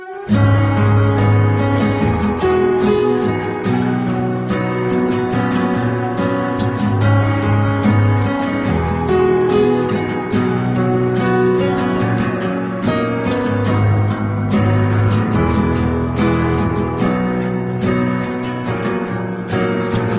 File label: guitar ringtone